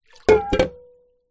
household water in large metal pot down on wood; zapsplat

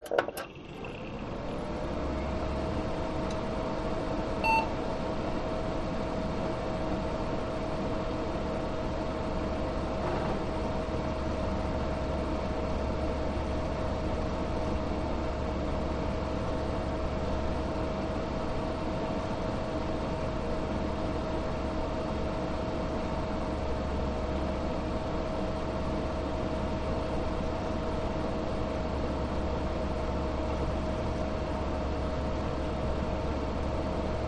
Computer Fan; gain walkers

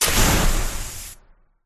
Fire Spell Flame Spew; sound spark

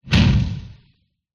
warfare mortar projectile launch

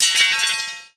impact metal bar thin long large; zapsplat

